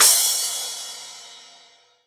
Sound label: Cymbal Crash OS; Sonic Foundry; Rondo Brothers Trip-Hop Loops & Samples; In The Valley